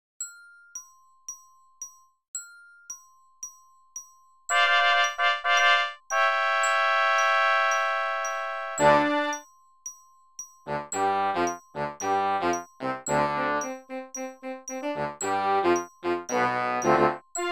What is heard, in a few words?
w click